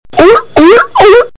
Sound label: Ayo Ayo Ayo Sea Lion